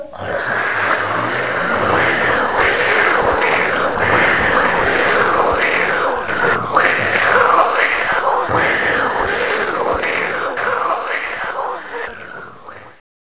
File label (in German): Fauchen, Drachen; welt-der-drachen